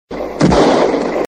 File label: Gun Shot